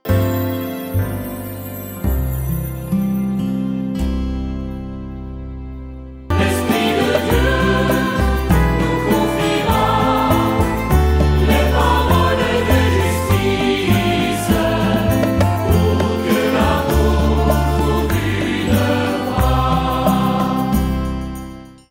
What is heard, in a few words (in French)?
refrain simple